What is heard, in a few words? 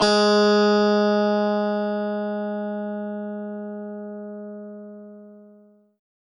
g sharp, guitar